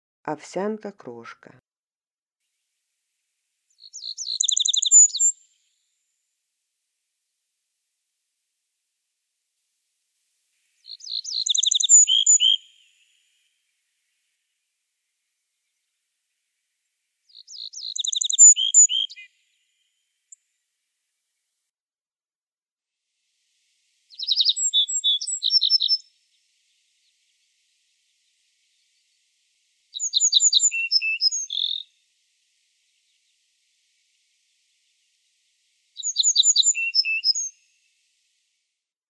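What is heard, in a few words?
ovsyanka kroshka golos, little bunting voice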